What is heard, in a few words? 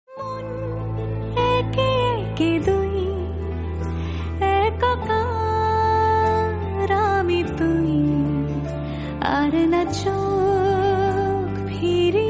Female Voice Bengali Love Song